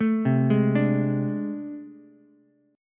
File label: A Minor Major 11th, down, Ukulele; arpeggiated; AmM11; JGuitar